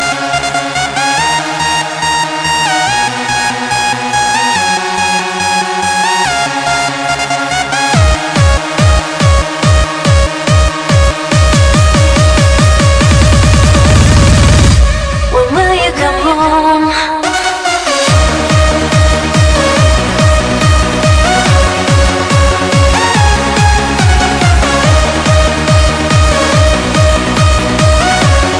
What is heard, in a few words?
I Like Techno Italian Ringtone